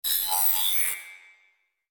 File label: robot